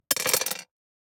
DICE on Glass, Throw and Roll, Standard, 6 Six Dice; Samples; ArticulatedSounds; DICE Sfx Party (Extended)